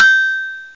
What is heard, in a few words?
CLNDING, bells